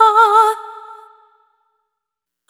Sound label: Stigmata, vocal samples; Sonic Foundry (Sony Creative Software) - Pocket Diva World Class Vocal Samples; Op-Erratic Loops